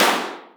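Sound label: snare, Boss DR660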